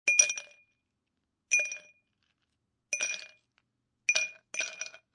Ice Cubes Drop In Glass Cup Many, Foley, Ice Cubes Being Dropped Into A Glass Cup, Many Ice Cubes